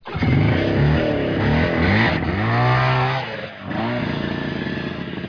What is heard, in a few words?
skidoo; history of invention; Machines